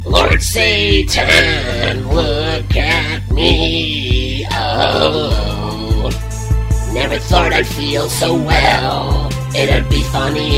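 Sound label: glockenspiel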